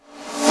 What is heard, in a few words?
REV PiccloSN, Roland JV-2080